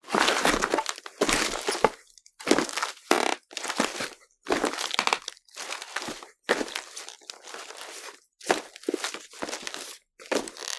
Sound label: Footsteps Male - Sneakers Cardboard